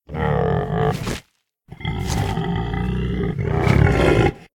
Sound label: Pachy sound